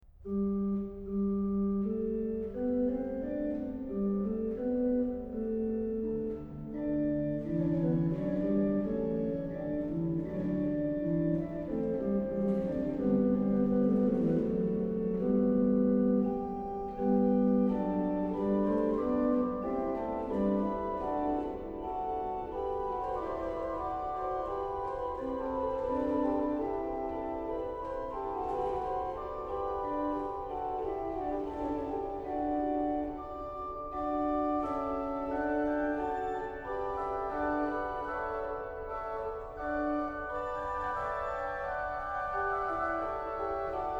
Herr Jesu Christ, meins Lebens Licht, organ; BACH; BACH ORGAN WORKS; CHORALE PRELUDES

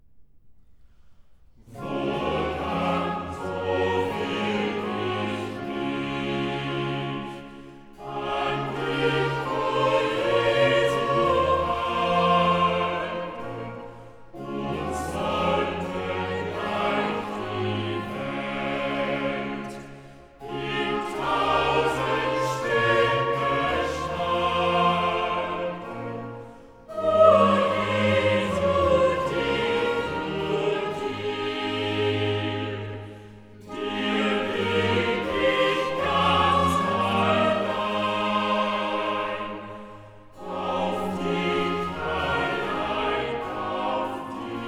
Chorale; Bach; Cantatas; BWV 133; Ich freue mich in dir; DGG

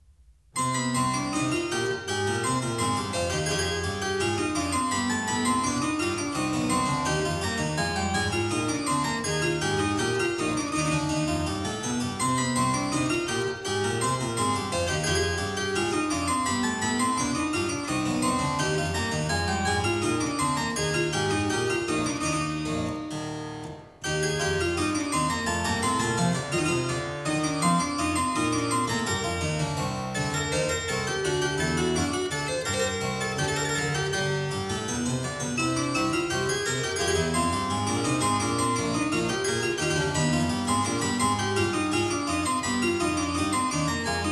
C minor, Air; French Suite No.2; BWV 813; BACH; CLAVIER WORKS; FRENCH SUITES

